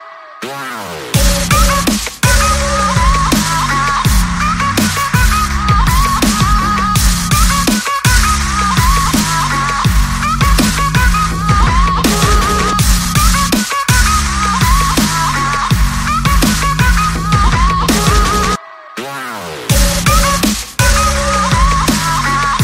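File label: Instrumental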